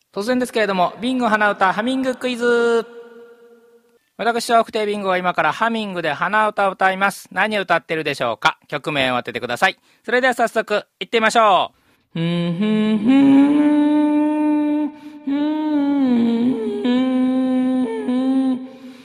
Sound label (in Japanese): hanauta